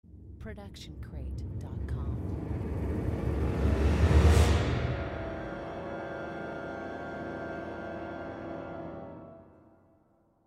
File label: dark texture